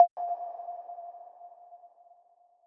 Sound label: reel Slow